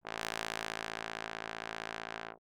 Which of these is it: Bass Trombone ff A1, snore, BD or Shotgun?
Bass Trombone ff A1